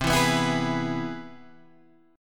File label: C Augmented Major 7th, Guitar, Open G